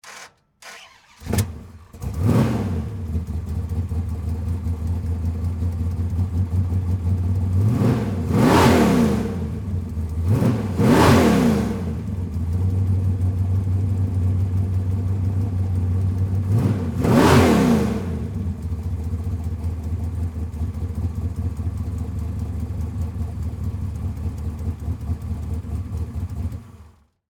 Plymouth Cuda, Transportation, Automobile, 1972, Start, Up, Rev, Engine, Shut, Off; Car; BlastWave